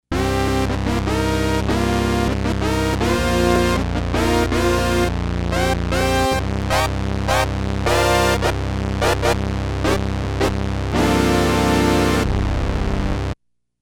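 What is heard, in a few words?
Filter Envelope Horns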